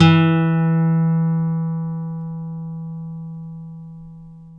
NYLON F E2, GUITARS; Synclavier Sampler Library

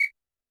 percussion, Roland TD-20

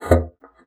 BASSOON; Xsample VOL-6

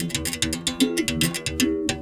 Tar, World Percussion

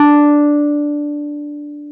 PNO, Roland Keys of the 60s and 70s, Wurly

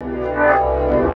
Chords Tones; Equipped Music; Loungin House

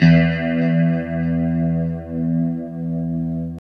LO-E E 2B -L, Guitars; Spectrasonics; Hans Zimmer Guitars VOL-1; JC-SHEEN

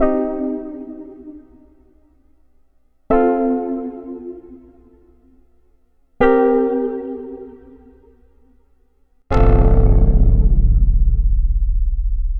Synth Chords; Eclectic Electronica

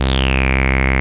BASS, Analogon; A-BASS 2

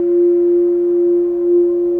FX Feedback Sus Loop; Drones Abstract

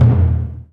MED DRUM, JAPANESE

